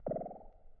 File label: dub wobble; angband